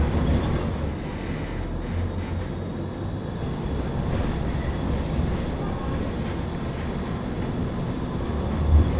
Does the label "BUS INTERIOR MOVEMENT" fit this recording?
Yes